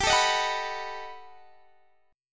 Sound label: B 7th G; B7/G; strummed